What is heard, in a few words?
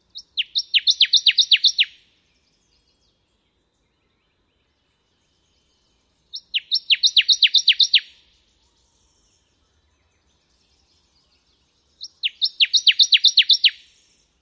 songs